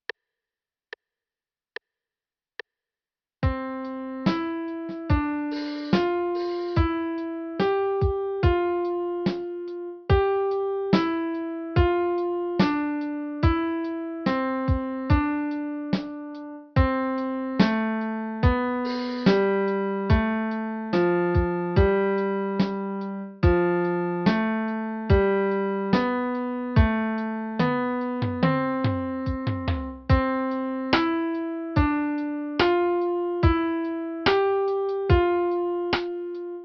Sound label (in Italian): Intervalli 5 note